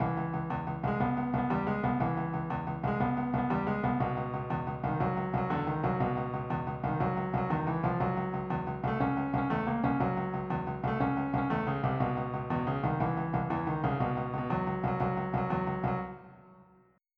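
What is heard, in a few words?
shuffle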